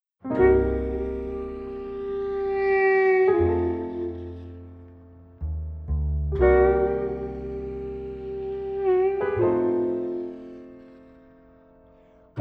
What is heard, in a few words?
Desert Jazz